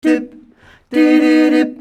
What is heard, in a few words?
DIPDIDIDIB, MALES; Best Service; Hyper Dance